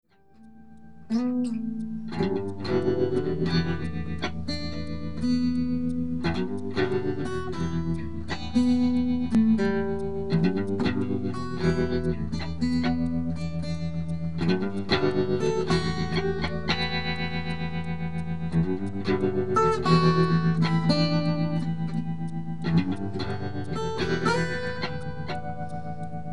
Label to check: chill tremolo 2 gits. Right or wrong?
Right